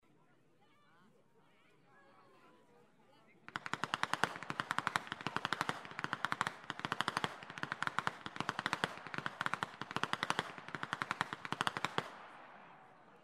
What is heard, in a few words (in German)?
schnalzen, flachgau; MAXGLAN III